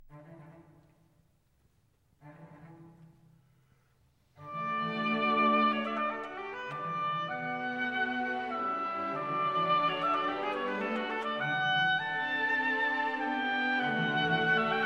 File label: Oboe Concerto, Allegro Moderato; Strauss, Richard